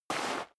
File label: step sneaker gravel light slide short